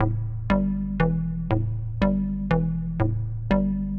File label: SH101 Arp (120BPM), retro house